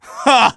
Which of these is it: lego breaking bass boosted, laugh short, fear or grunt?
laugh short